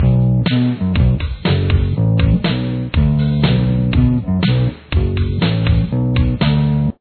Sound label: bass